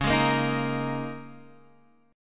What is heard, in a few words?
Eb 7th Suspended 4th Db